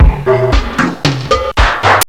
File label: EFX PERCUSSN; OLD SYNTHS; Rubber Chicken Software Sample Bank